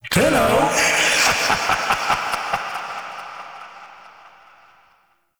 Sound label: Dissolve; Warframe; Mods; Peculiar Mod